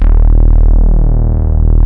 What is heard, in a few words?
MOOG D#0; E-MU Formula 4000 Series Vol. 3; Analog Odyssey